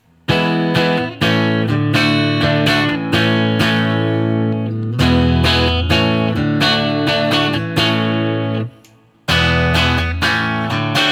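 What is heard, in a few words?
Guild S300 Tiny Tweed Open, guitar; Open Chords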